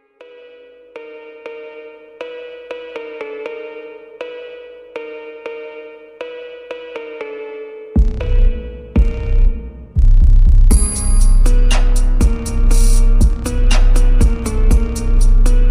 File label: Phonk Music